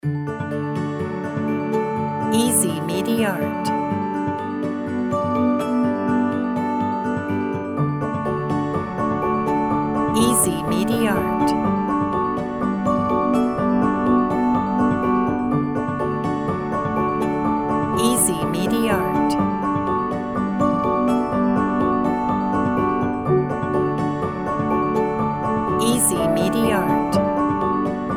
bright days underscore

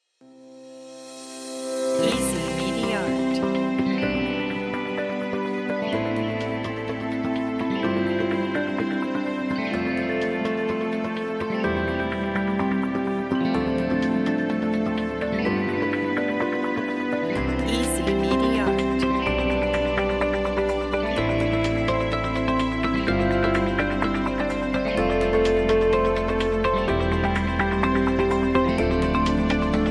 light motivational corporate

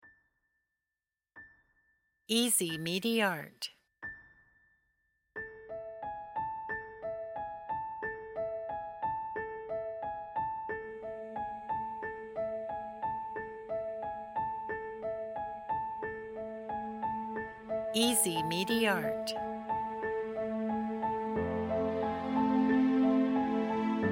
cinematic coda

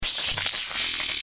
neo arcade movement

